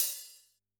Hf Hat, drum

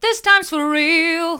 this times for real hard, vocal

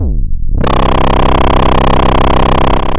Sound of a chainsaw

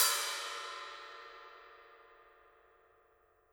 Trash

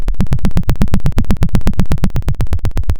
pop, Sound of a helicopter

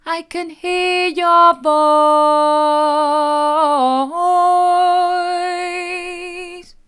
i can hear your voice mid, vocal

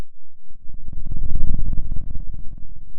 Sound of a dinosaur

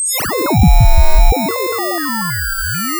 pop

